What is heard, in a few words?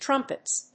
trumpets